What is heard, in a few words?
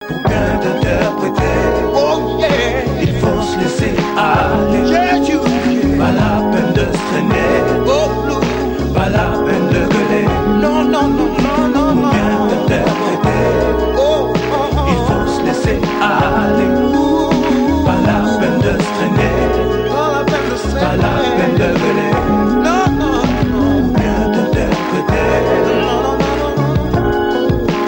tribal blues